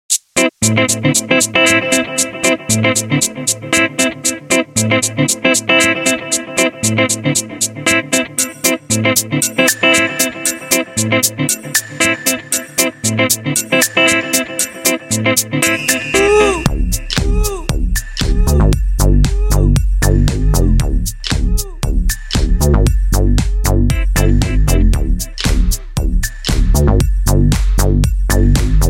IPhone 12 Pro Max Ringtone

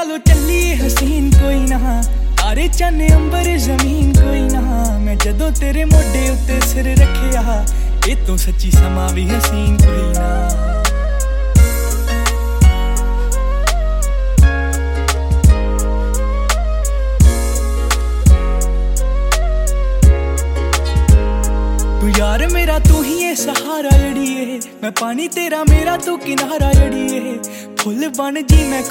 Ringtone Punjabi's

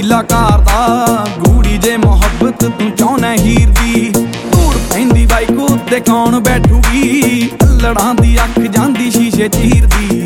Punjabi Ringtone

